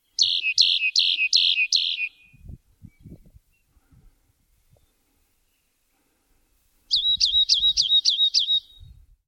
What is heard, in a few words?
Rock Wren